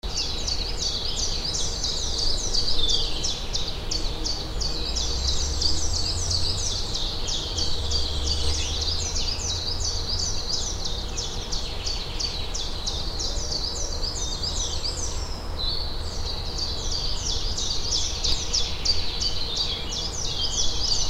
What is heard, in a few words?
atmo germany berlin tiergarten birds